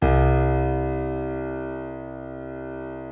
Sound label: C2, piano